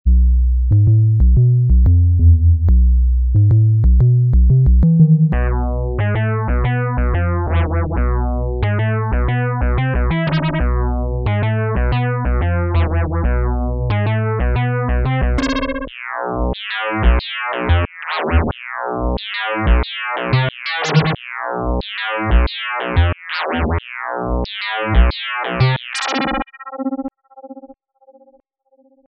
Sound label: Poly Synth